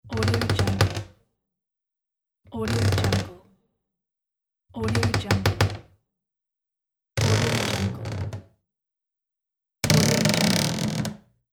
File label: old wooden closet door squeak